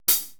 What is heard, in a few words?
80S DRUM SET